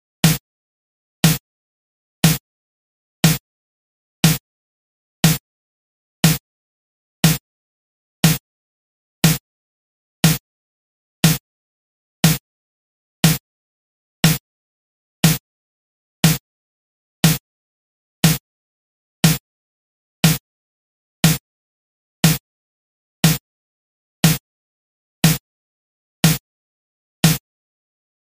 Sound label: Snare 120 C; Beach Day